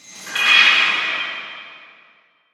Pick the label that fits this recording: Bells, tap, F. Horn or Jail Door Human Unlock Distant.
Jail Door Human Unlock Distant